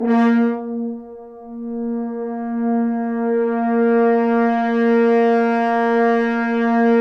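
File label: French Horns sfz; Roland LCDP06 Brass Sections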